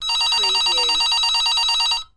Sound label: Telephone Digital Ring